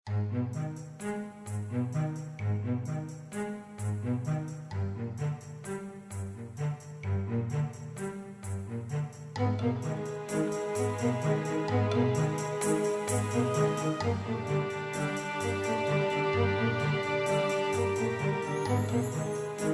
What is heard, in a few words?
riverboat